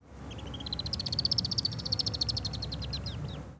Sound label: Apus melba